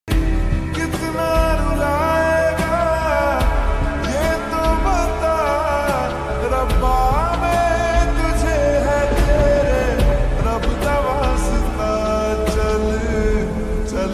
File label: lofi x slowed ringtone